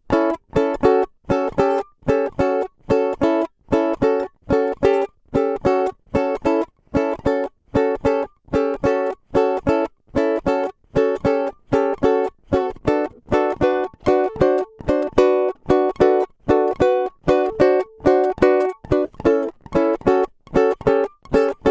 A Blues Shuffle Exercise in the Key of A, Blues Ukulele